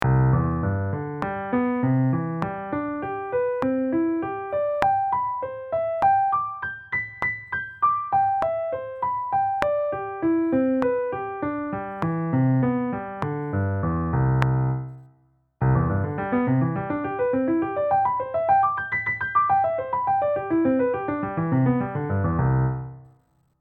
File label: Slow n Fast